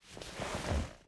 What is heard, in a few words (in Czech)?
vrneni v aute; Mafie 1